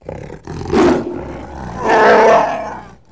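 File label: Wookiee male attack; Star Wars Knights Of The Old Republic I; XBOX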